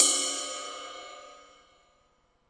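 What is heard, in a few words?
Yamaha RS7000 hi-hat cymbal B7; Drum machines; Yamaha RS7000 Cymbals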